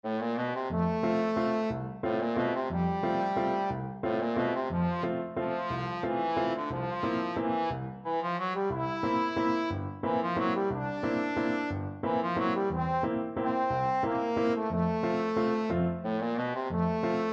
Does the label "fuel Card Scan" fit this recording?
No